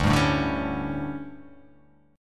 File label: D Major 7th Suspended 4th, chord; strummed